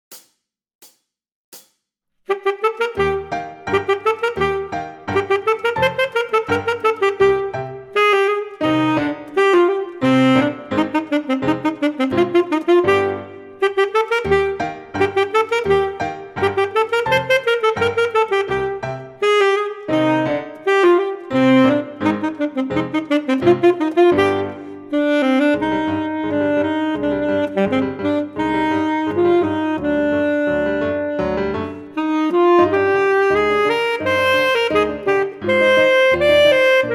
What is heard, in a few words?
Scherzo Full Sax